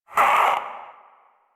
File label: Possessed Red Veil Vocal Pain; Warframe; Priest Quest